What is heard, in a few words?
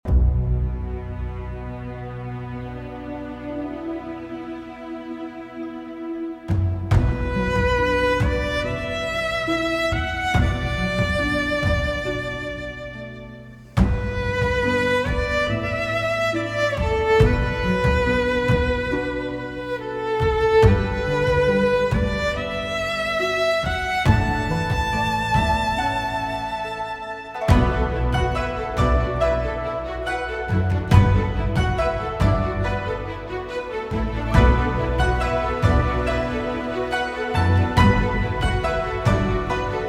splash bgm; game/city-interior